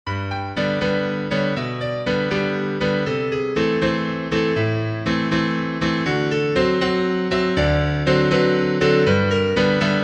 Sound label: piano